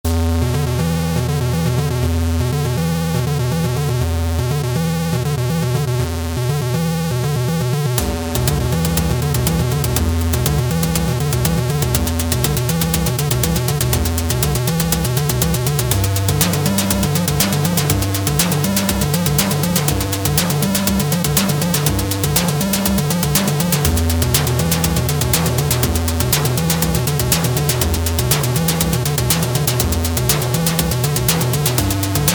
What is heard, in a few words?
8 bit